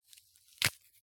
Hand Ripping Apple, soft attack, twist, clean, alternate; Eiravaein Works